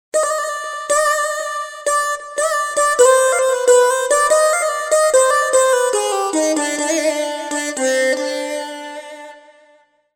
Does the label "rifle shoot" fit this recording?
No